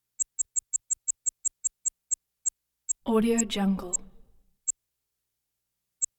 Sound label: synth beep soft zip